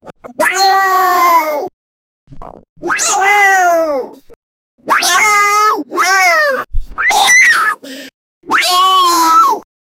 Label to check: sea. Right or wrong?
Wrong